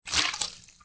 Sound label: splat; Soldier Of Fortune II Double Helix; XBOX